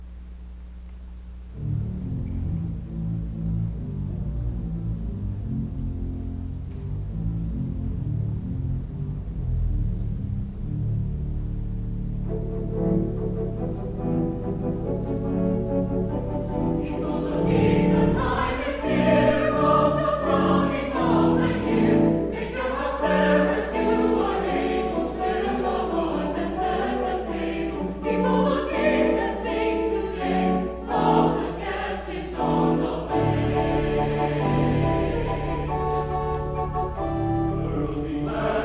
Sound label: Chorus; People Look East